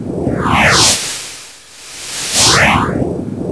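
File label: FLY N THING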